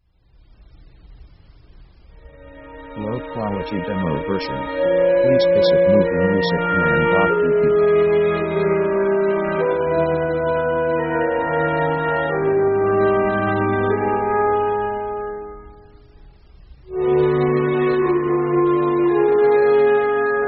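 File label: emotional orchestral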